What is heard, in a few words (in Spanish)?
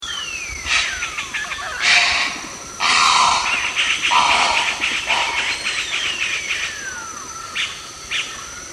garza mora; cantos